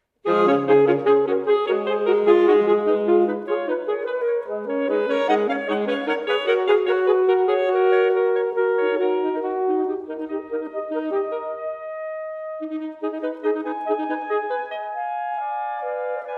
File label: Fanfare